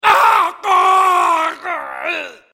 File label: infected; csgo